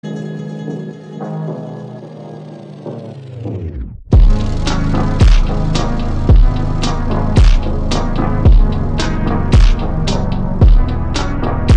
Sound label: Slowed; ringtone